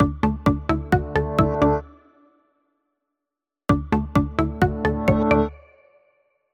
xiaomi redmi 5 plus ringtone

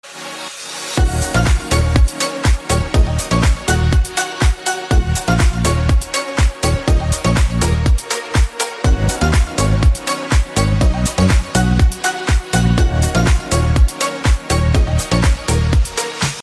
iphone unshakeable beats ringtone

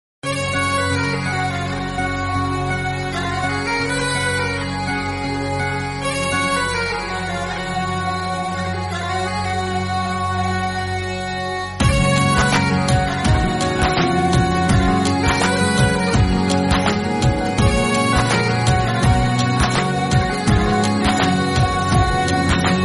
kannada tune ringtone

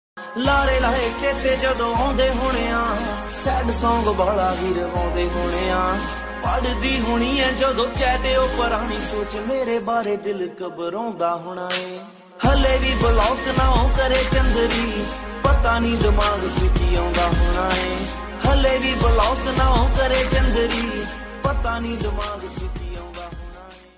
punjabi ringtone

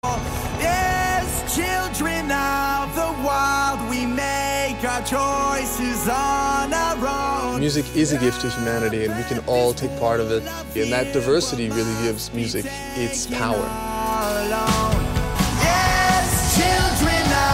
trance ringtone; tomorrowland

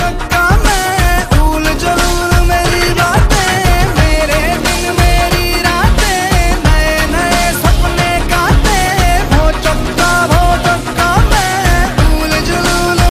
hot bengali ringtone

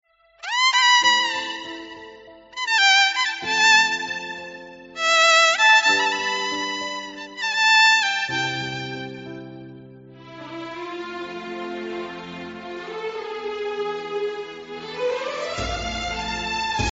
instrumental ringtone

